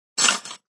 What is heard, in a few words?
Silverware thrown into tray.; Silverware Drop; Kitchen Sound Effects; Sound Effects:Household